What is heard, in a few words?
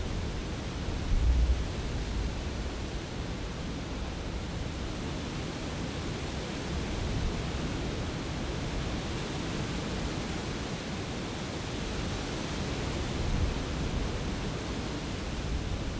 beach wind loop; background